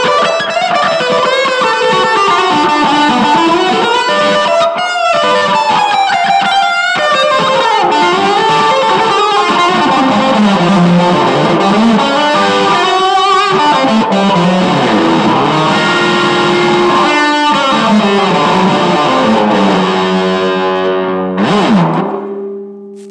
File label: bluesy squiddly guitar bit